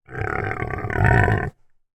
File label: Pig Snort; Coll Anderson